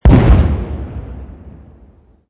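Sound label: explosion medium; modern warfare 2; amb elements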